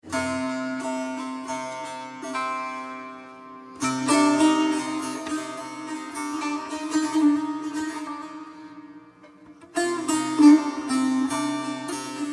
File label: Music Tanbur